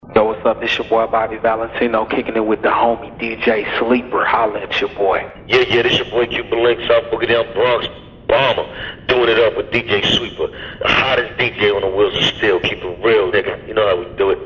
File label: drops